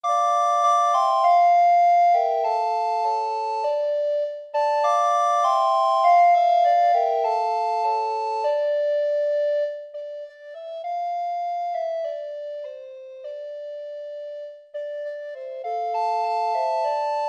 recorder trio

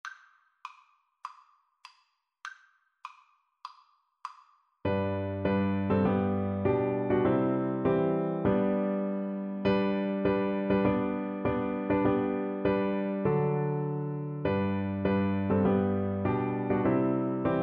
glory to his name, Viola

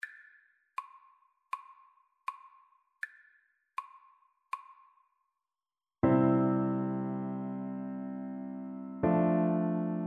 harmonica

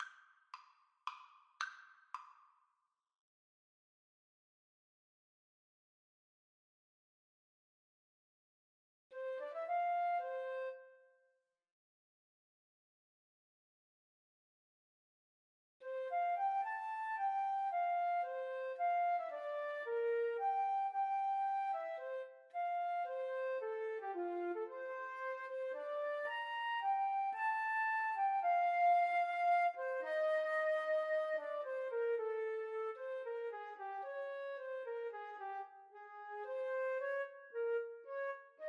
Flute duet

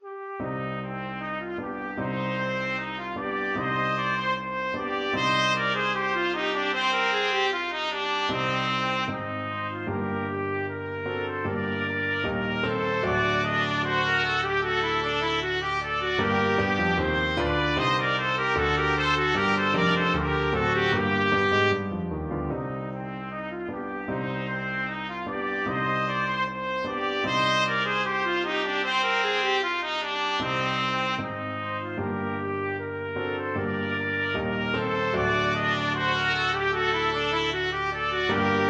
trumpet duet

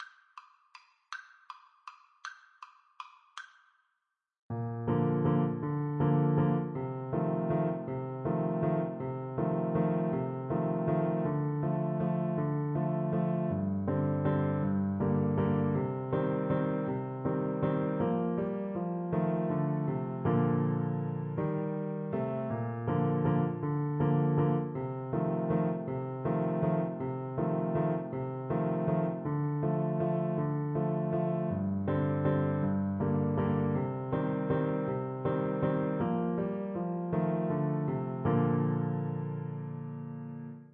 skaters waltz, Trumpet; waldteufel

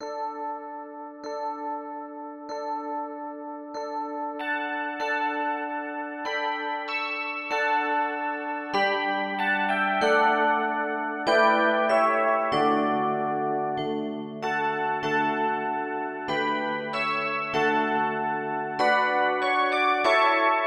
Christmas Bells, handbells

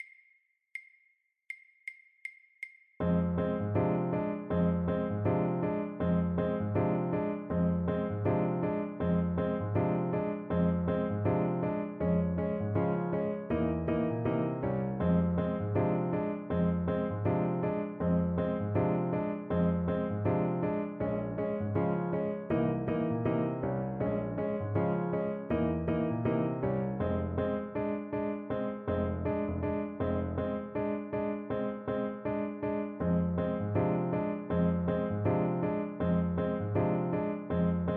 move to the left, French Horn

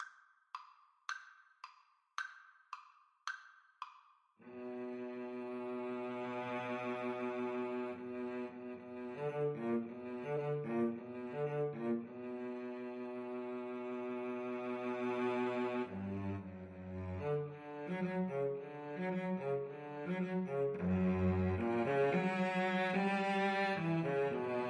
Cello Duet

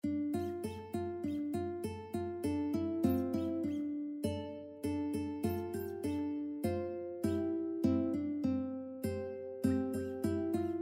sinnerman uke

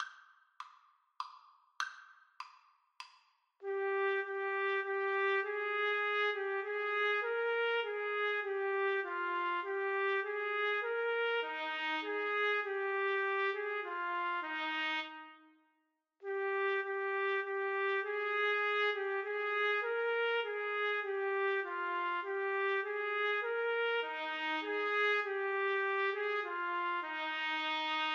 trumpet duet

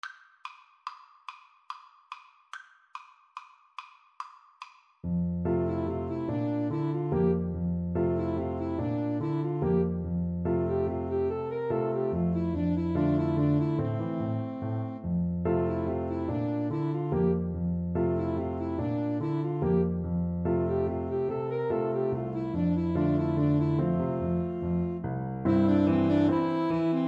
flute sax, Flute Saxophone Duet

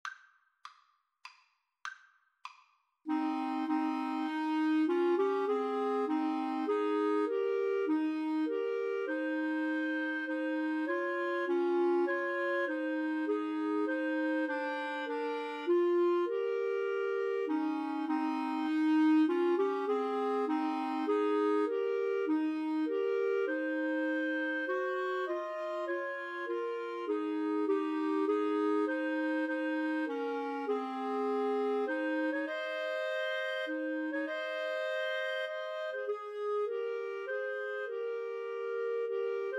Clarinet Trio

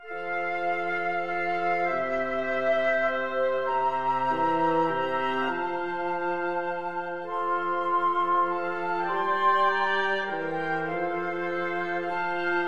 mozart kyrie eleison wind quartet

